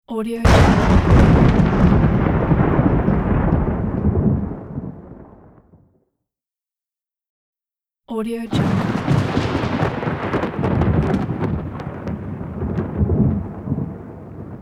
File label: thunder